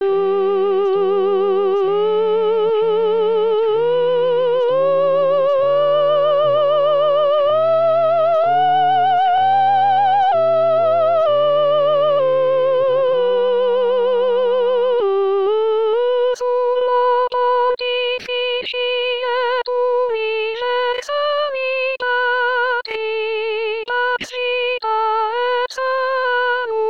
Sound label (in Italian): Christus Vincit Soprani